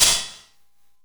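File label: shaker, percussion; FX; AKAI S6000 CD-ROM - Volume 5; FX PERCUSSION 3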